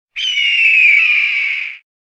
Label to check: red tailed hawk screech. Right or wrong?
Right